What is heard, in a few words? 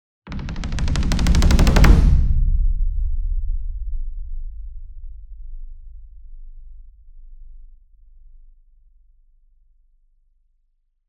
Single Drum Taiko 60 Inch Roll Hard Beater Near; boom library; Cinematic Strikes Construction Kit